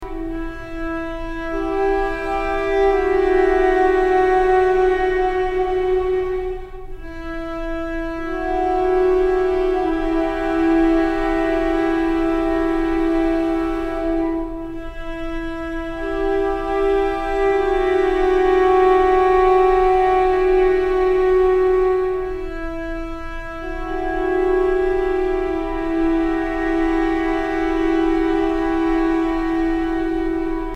Strings Loop; The Last of Us; Apandt J and S Strings Loop

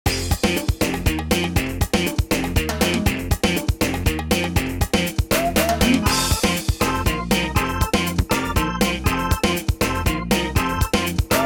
Bg Music

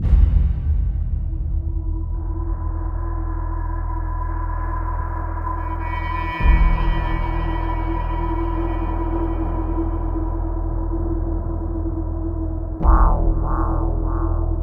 Pause Menu Music

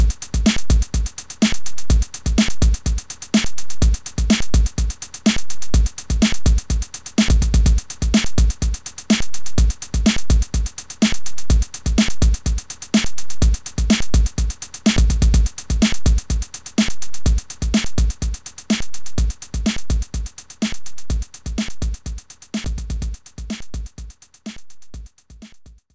Loading Tune; Leavin' Teramis; Amiga

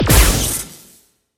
Lightning Hit; Robobeat

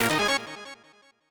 Combat Start; backpack hero gamerip 2022

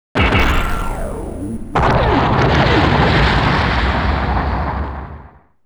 Fail; robotics notes dash; ps4 switch windows

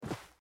land generic

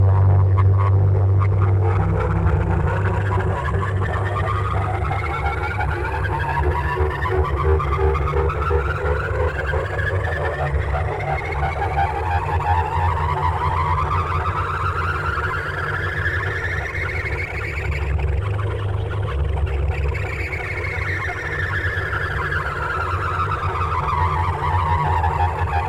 El Engine Revupdown

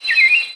bird random; Level4 Hidden World

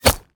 Bullet Impact Concrete Brick; jasem just another shooter with electronic music windows 2017

